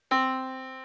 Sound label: Yamaha Disk, piano sound